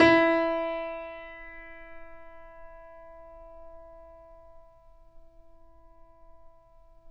Mf E 2, Grand Piano off mic; AKAI S6000 CD-ROM - Volume 2

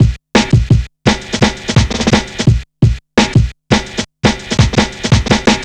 170, Drum Loops, Drum & Bass

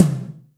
TOMS; Zero-G - Chemical Beats

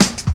Step Ez Cut, drum loop, jungle, 168.5

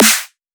snare mediocre ring

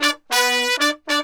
100, BRASS SAMBA

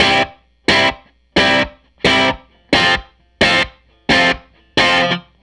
Tele Guitar Loop

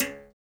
Berimbau; Roland LCDP11 Africa VOL-1

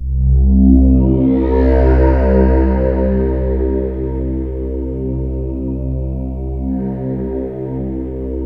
WHALES, ENSONIQ TS-10; Rubber Chicken Software Sample Bank

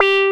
ACI WAAH BS, TECHNO; Pro-Rec - Synthsonic; Z1 TECHNO

